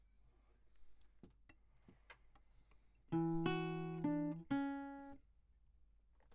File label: Classic Guitar